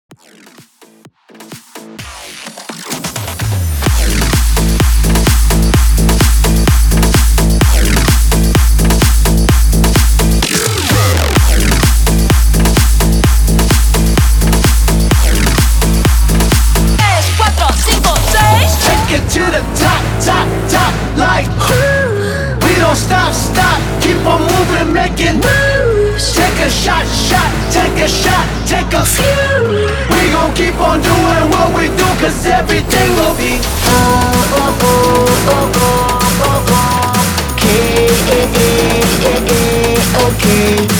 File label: BIG ROOM 128 BPM